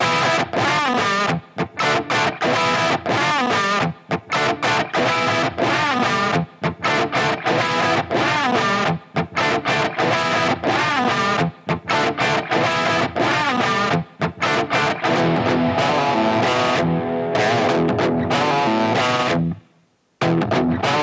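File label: Electric Guitar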